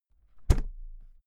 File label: briefcase close hard; industrie wirtschaft; bueroarbeit